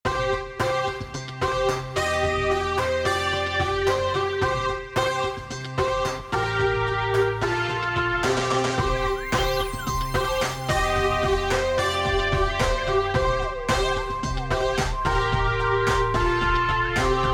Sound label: MIDI MIX